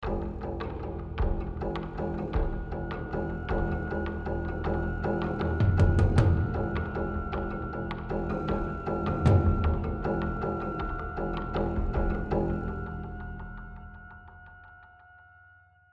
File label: Rage Combat; The Last of Us